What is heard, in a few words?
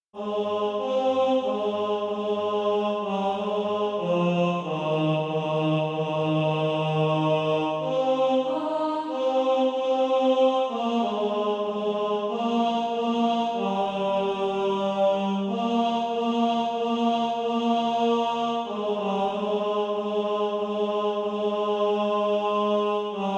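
tenor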